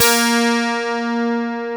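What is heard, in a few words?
12 string electric acoustic guitar B2; AKAI S6000 CD-ROM - Volume 1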